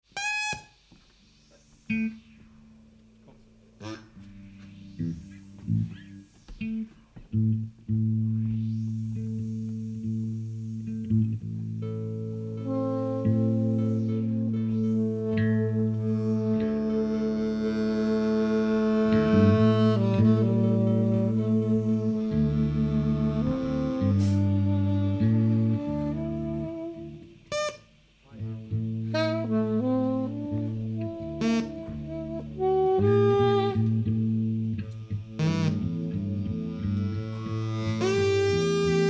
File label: Siren Call, Orchestra; Cube Orchestra